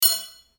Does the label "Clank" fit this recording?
Yes